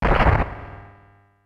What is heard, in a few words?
Ground Barrage; Super Smash Bros